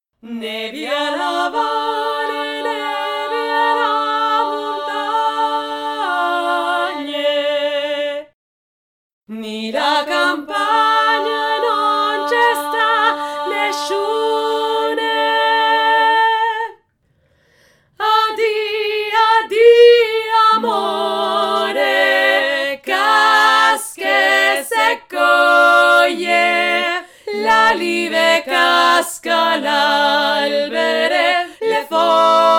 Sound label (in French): 3 voix; CASCA LULIVA